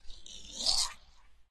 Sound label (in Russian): Plevok; Z Plevaka Plevok